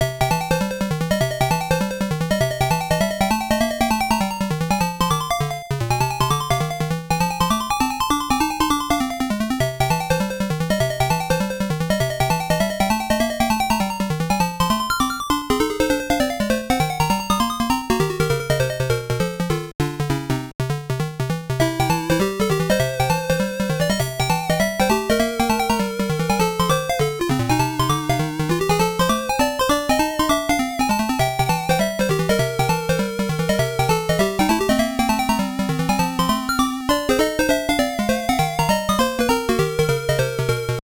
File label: BGM; Cool Spot; Game Gear